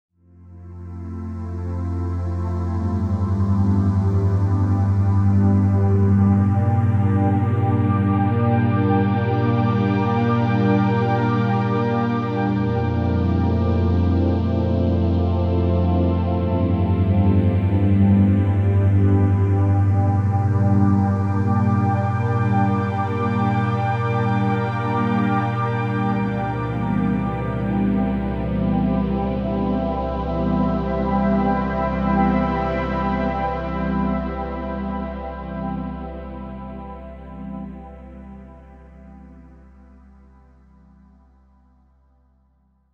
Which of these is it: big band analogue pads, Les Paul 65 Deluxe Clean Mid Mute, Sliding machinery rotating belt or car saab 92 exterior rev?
big band analogue pads